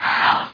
YAWN; Dogz; PC Gamer 34

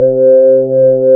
Hamm C4; D-SoundPRO 2.2

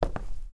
tile; player; Group Mapping Project

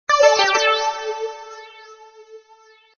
win sound; Puzzle

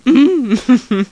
laugh; Leonardo The Inventor; Broderbund; Riverdeep

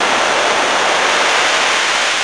flying; PLANET X

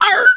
yelp

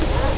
ROTATE

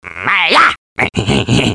chop; Screen Wreckers

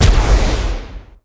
laser

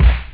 nature, drums snare; OctaMED SoundStudio V1

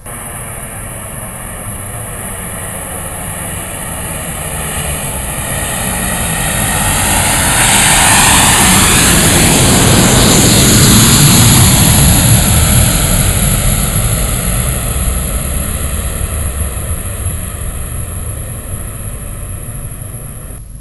747 Jet Low Overhead Pass; Soundsation; Vehicles

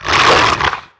setian attack; DAoC; Toa; Gamestar

